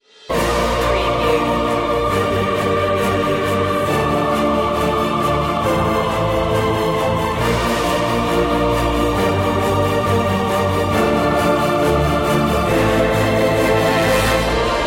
the fountain of life no drums

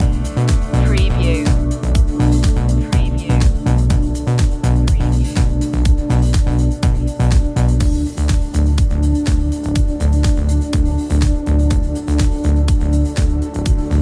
loves trance loop

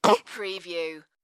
Baby Grunt

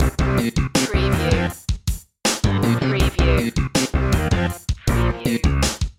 retro video game racing rock loop

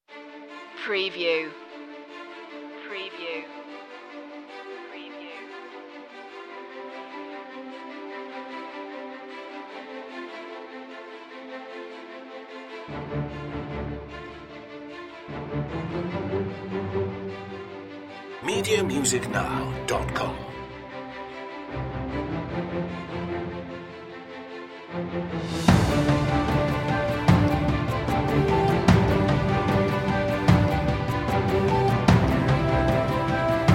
loop intro alt perc; media music now

